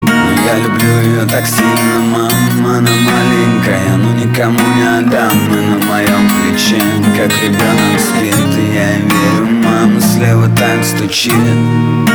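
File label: guitar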